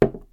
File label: place plastic cup